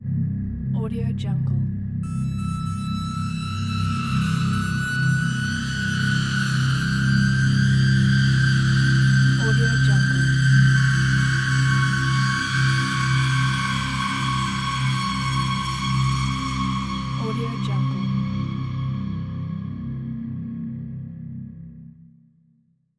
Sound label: dark ambient; umcsound